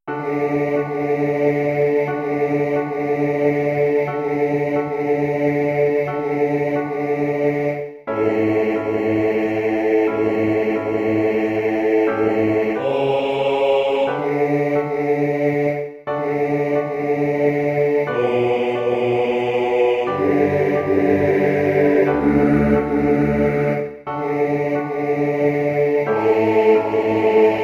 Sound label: we are building bass